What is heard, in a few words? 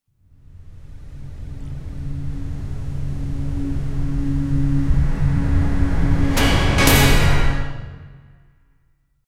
add triumphant heroic, superhero